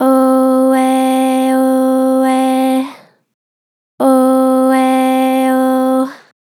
Gen Vib Ohh Eh Ohh, Vocals, Natural Vibrato Gentle; Harmor Vocals